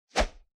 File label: arrow swoosh